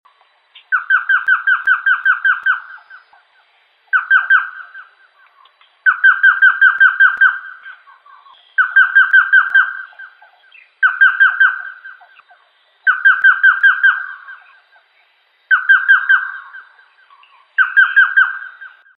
luntur jawa

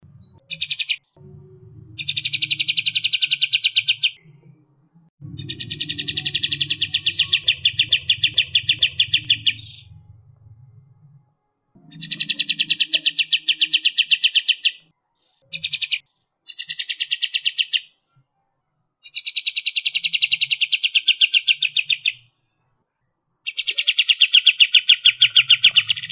kapas tembak suara asli

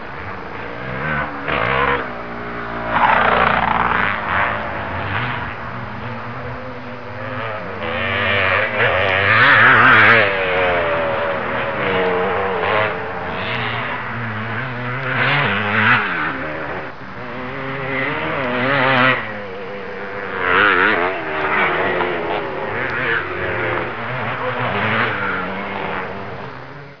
suara mobil

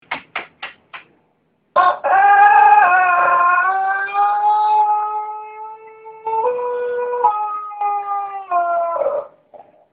ayam pelung; juara

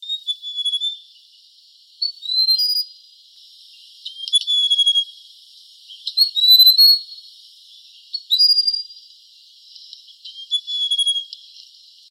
cinenen gunung

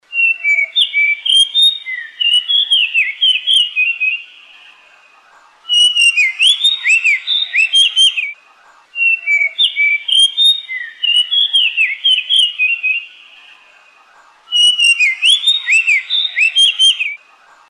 suara cingcoang alisputih

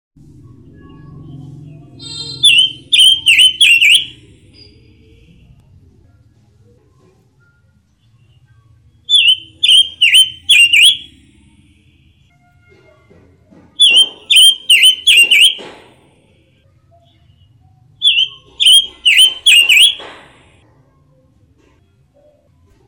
robin pancawarna

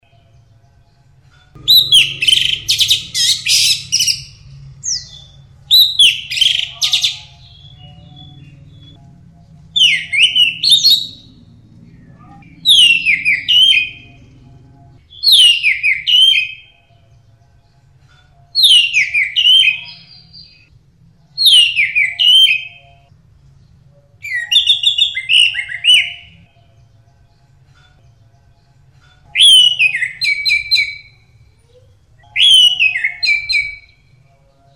anis merah ngeplong

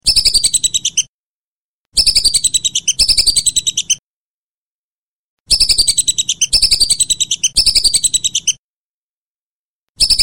belibis

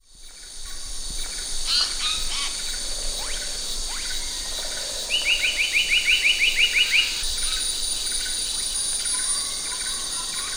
kicauan asian paradise

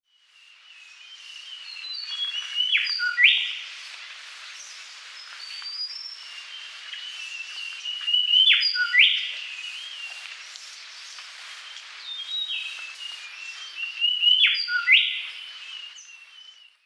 kacnilan perut kuning

